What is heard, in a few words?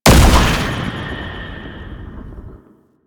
Unyielding Gallop Hit; Warframe; Warframes; Dagath